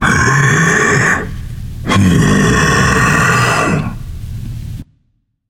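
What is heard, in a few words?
non-verbal breath; smite; atlas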